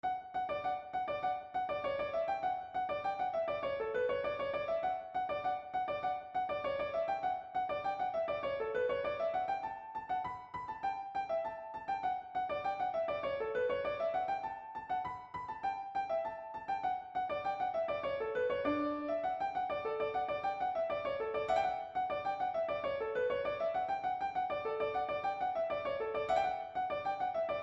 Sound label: Vln Lead; Fairy Dance